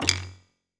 no click

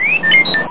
Robot; megatron

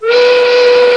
toot

